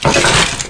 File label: wood; gearbox; debris